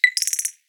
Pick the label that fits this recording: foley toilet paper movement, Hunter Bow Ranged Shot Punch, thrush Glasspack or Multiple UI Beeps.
Multiple UI Beeps